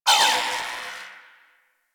Disrupter Pulse A; Warframe; Corpus Modular Crewman

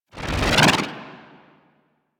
Golden Band Staff Expand; Warframe; MonkeyKing

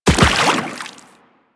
Land Water, Footsteps; Warframe; Teshin

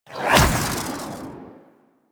Universe Ring Charged Cast Burst; Warframe; Warframes; Nezha

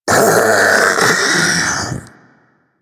Infested Splitter Split Vocal Death; Warframe; Grey Strain

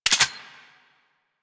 Glass Shotgun Mech; Warframe; Tenno; Weapons

